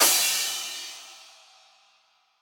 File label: big win start; jackhammer